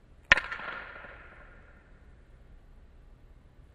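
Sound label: Hockey Medium Impact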